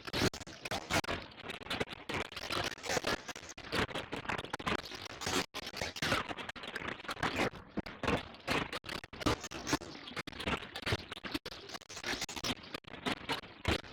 Void Loop

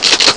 Reload

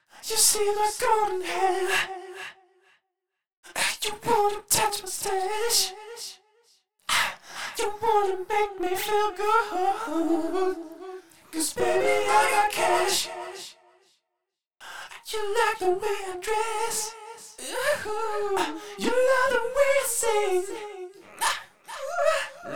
vocals verse 2 64 beats; Beatbuddy; Wii U

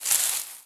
Grass footstep; starbites

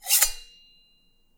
Sweep - Knife

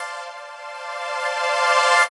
Music Frontend

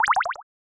Magic fail; Syrup 2 Candy Alchemy RPG

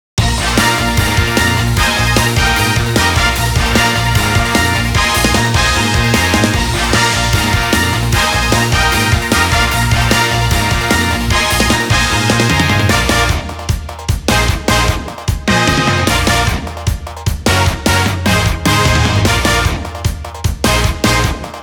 Battle Result; Dragon Ball Z Dokkan Battle

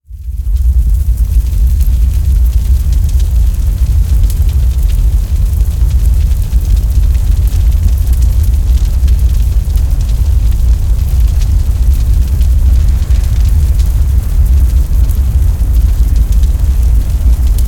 Fire; Frogsong

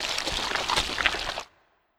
Blob moove; Legend of Keepers (gamerip) (2019)

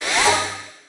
Music Frontend

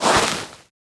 Human Footstep Desert Skip; starbites

